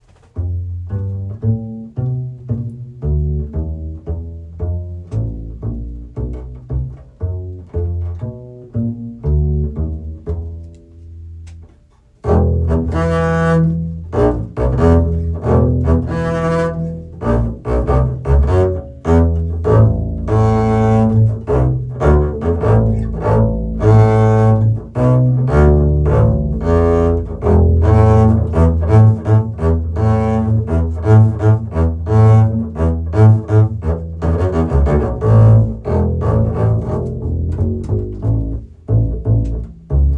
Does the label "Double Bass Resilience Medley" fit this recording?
Yes